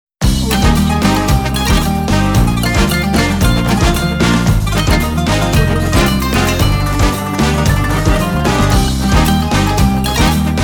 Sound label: exuberant turkish ident; audiotr